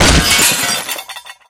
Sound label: robo impact hard; source engine; tf; mvm; physics; video games